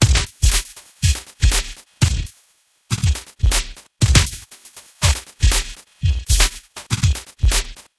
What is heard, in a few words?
Beat, Drums, Eclectic Electronica